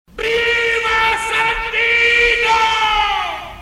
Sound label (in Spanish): Grito Viva Sandino